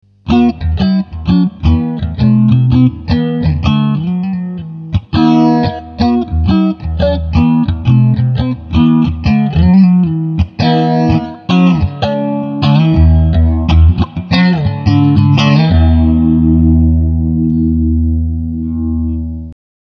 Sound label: phaser